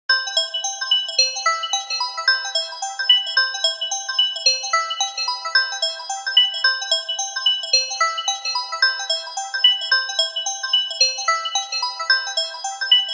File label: Ring Starlight; HTC Ozone